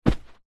footstep single foot in socks on carpet; zapsplat

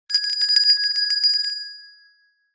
bell small hand ring short

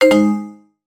app alert tone

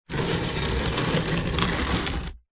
foley wooden box large slide gritty concrete

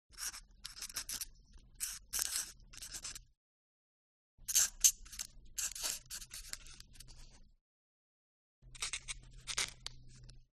FOLEY OFFICE Quill Write; smartsound

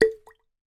foley cork pull out from wine bottle

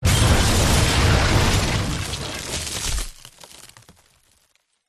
impacts building collapse more glass

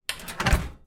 household oven grills door close

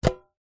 foley tin metal cylinder lid on catch; zapsplat

